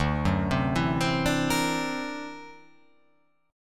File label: D Augmented 9th down, guitar